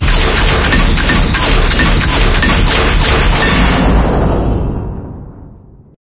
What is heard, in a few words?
advisor pod reveal; ambient; source engine; ep2; outland